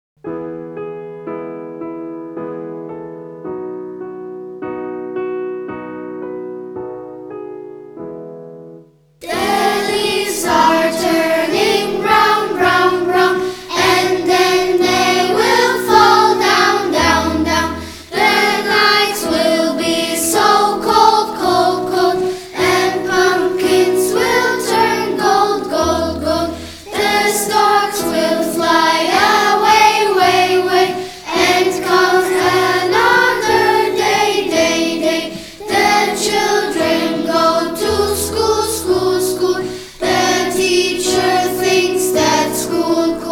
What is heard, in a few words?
Autumn Clapping Rhyme; englisch; schulstufe 4; kringlmingl englisch 4